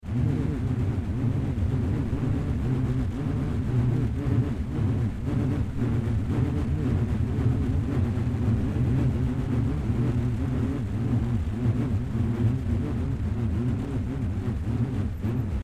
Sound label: reconstructed sounds